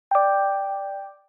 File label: Alert nudge; Nokia Lumia-1320; Lumia 1320 Southeast Asia